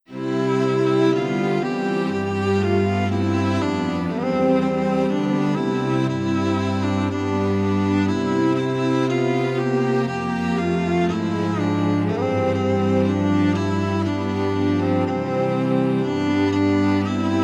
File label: Ode To Joy Strings in C